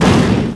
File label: EXPLO